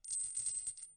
chain light; angband

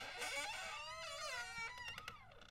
door creak; angband

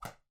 hit whip; angband

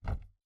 wood thud; angband

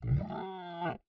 howl distressed; angband

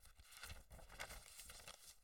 book page turn; angband

